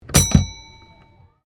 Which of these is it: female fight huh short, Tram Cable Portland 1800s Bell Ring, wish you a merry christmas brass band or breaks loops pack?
Tram Cable Portland 1800s Bell Ring